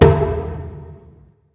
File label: taiko high; smite; raijin